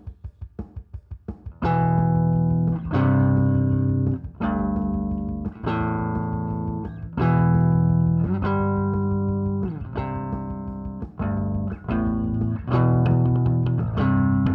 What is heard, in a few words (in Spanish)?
Rock; ultra high activado; HAMMER; Córdoba; GTO Series de 100W. con 6L6